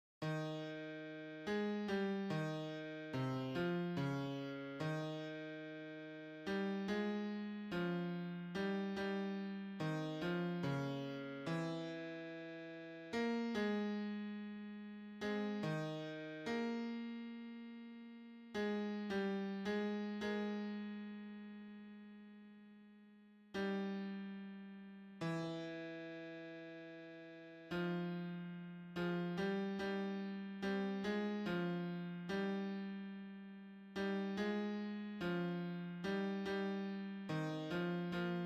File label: Bass